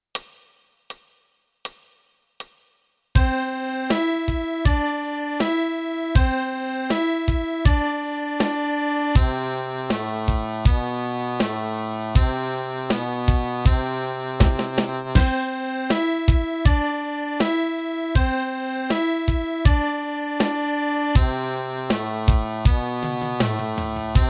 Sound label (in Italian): Intervallo